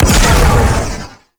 Heavy Cannon Fire; Crew Ships; Vehicles; Weapons; Warframe; RailJack; Corpus Crew Ship

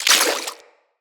Collectible Pickup; Inf Eris; Pickups

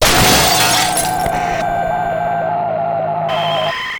Screen Blast; Ambience; Props; Warframe; Nineteen Ninety Nine

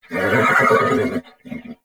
Horse Vocal Mount Neigh; Vehicles; Vocals; Warframe; Duviri

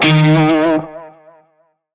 Shawzin Flourish Oct Two D Sharp; Music; Warframe; Narmer Shawzin

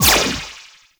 Choir Erupt Firing Eye

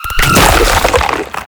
Horrors Charge; Warframe; ManInTheWall; Octopede

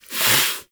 Pet Snort; Enemies; Warframe; Catbrow; PetShip

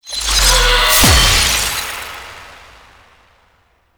Empowered Geode Cast; Citrine

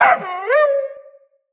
Wolf Cub Trapped Call Far; Animals; Wolf Cubs; Orengal Wolf Cub C

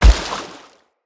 Step Water, Animals Foley Steps; Bromius

